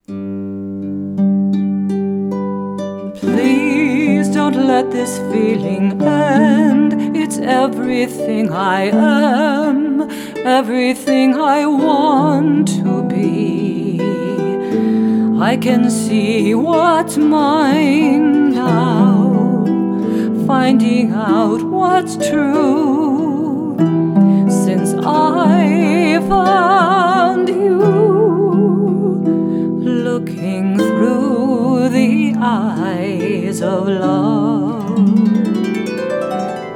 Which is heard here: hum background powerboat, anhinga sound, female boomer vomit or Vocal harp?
Vocal harp